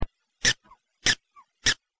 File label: prairie